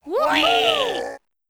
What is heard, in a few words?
fs Rise Arms; netent